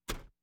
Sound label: Automobiles various